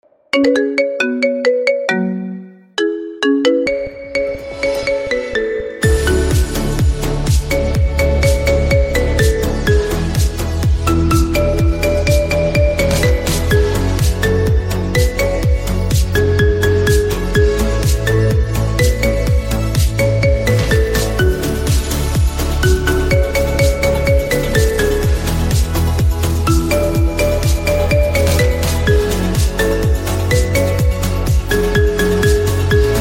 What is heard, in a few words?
Marimba Remix